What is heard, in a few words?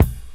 shiny kick sound a sharp key, kick sample tuned to the A# note. Loudest frequency: 734Hz